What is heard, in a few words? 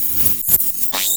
VERMIN